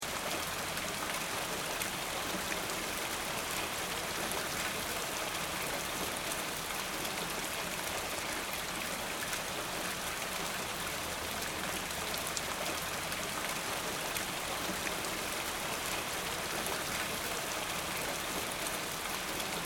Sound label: Weather Rain House Gutter Loop